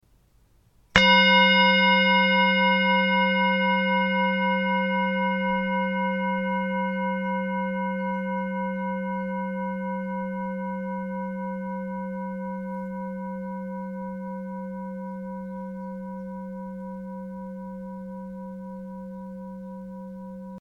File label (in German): Klangmassage, Klangschalen